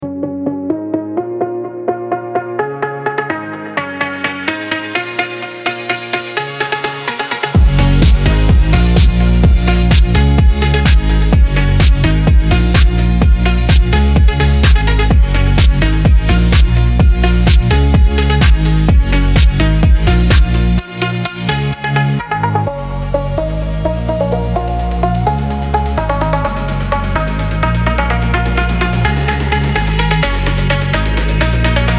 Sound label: FONK, PHonk